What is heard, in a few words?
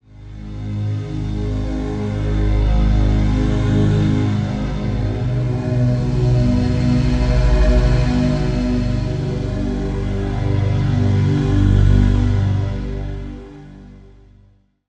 Pad; Routed Sound; Spire Expansion - Doom Carpenter Vol.1